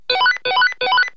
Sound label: Transceiver